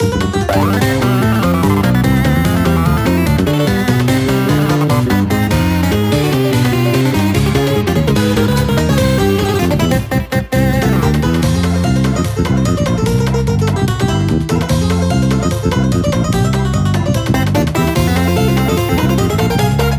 FISHING

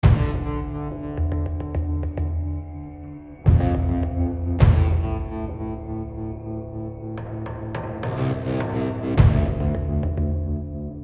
Tension; AB Tension 4 High Block 3

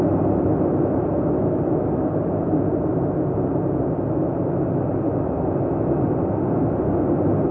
amb Totem Inside; monument valley

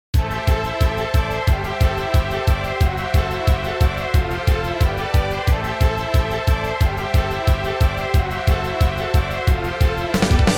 music cave boss; Dangeon Quest; android game music

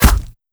bullet hit player; gamerip; shattered horizon 2009

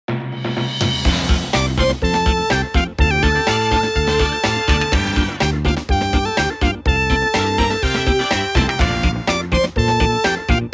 Jingle Story Happy; Taiko no Tatsujin Wii Ketteiban

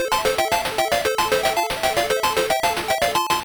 Level Complete; Pengo; Game Gear

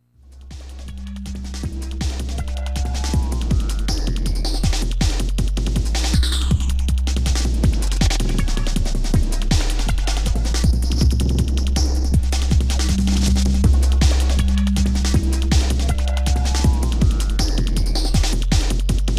menu song

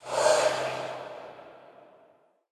Soul impact Spirit release

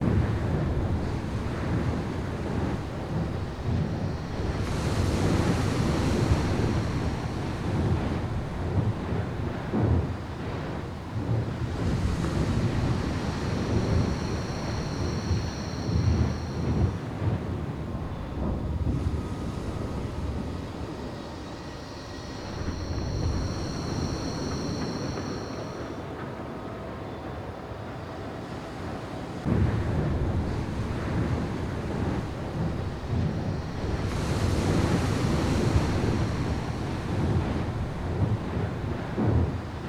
BGM Environment Ship; God Eater Resurrection

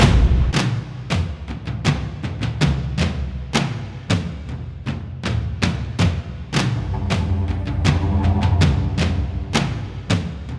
Drums; For Honor

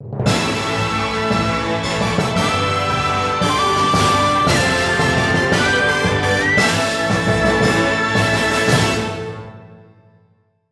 Horse Racing - Cup - Champion; mario sports superstars 2017 3ds gamerip

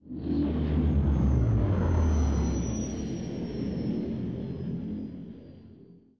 flyby; Tds Amb Millflyby; Star Wars Tiny Death Star; Android

